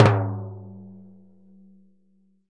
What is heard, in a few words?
TIMB LO, Synclavier Percussion, World & Orchestral; ILIO; Synclavier Percussion 2